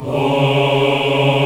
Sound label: AFRO AH C#3, CHOIR AHS; Spectrasonics; Heart of Africa